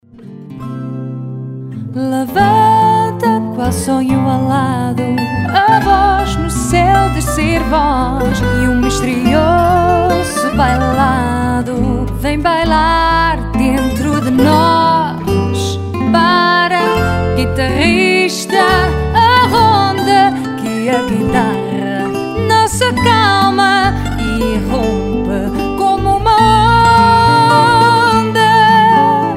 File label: guitarr